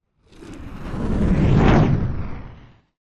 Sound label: Jet By Processed - Passing Space Ship - Low Engine Whoosh By; Hollywood Edge; sound designer's toolkit v2